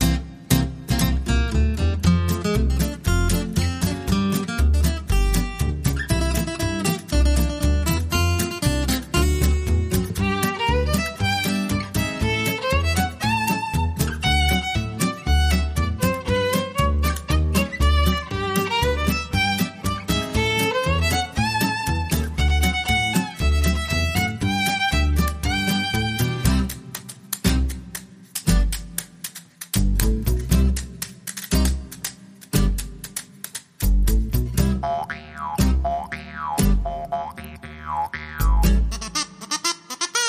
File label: Jug Band Jamboree